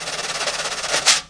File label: washboard snare roll; Weird and Interesting Hits; single hits